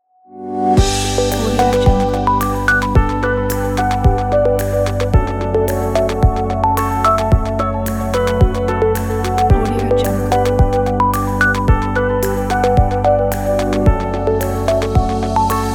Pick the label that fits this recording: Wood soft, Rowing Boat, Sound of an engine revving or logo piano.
logo piano